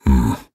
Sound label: Nonverbal Yes; Ornn